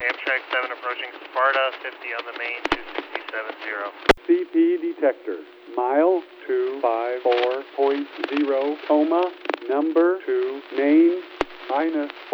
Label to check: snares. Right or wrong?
Wrong